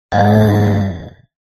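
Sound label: sounds of goblin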